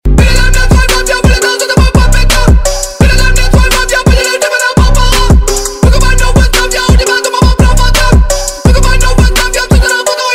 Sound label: Phonk; PhonkRu